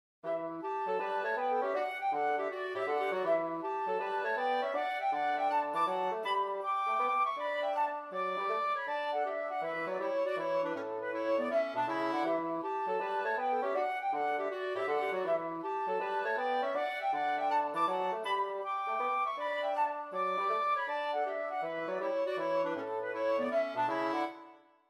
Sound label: renaissance example